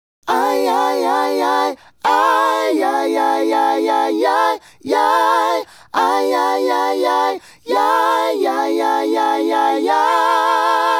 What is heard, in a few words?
Male Group, Hip-Hop and RnB Vocals